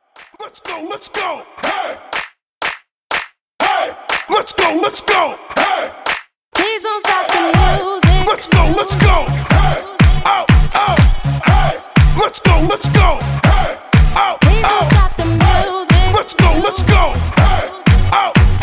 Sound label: Clap Hype Intro 122 Bpm